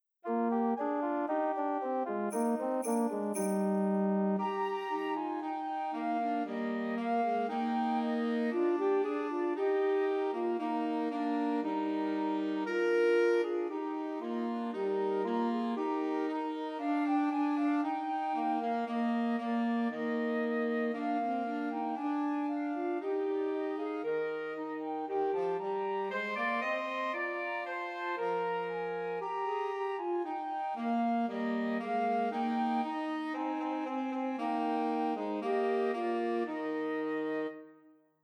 Deck the Halls, Christmas Trios for Flute Alto Sax and Tenor Sax